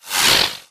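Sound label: Drone Movement Turn; Titanfall 2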